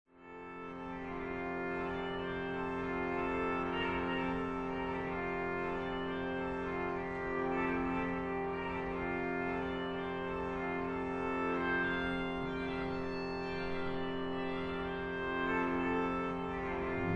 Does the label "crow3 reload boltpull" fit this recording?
No